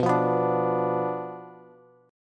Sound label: B Diminished B down Bass; Bdim strummed; JGuitar